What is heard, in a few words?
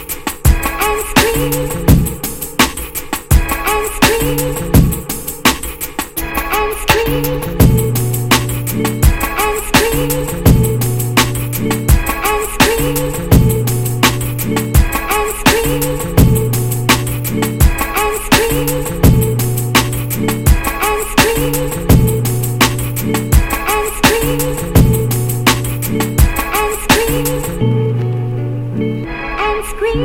hot lick squeeze